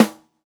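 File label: snare, Roland MV8800 drum kit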